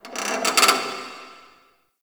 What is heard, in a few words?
Metal Creak Small; Warframe; The New War; Zariman